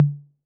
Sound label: Tom Mid A 808, Clean Digital